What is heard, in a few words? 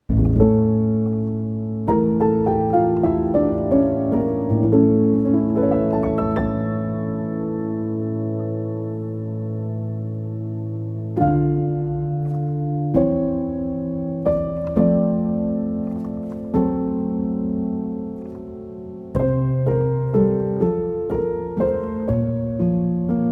Waltz, Lullabies; The Cat Returns